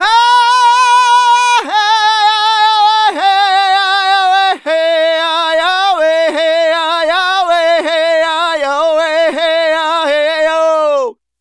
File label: Native Vocals, Native American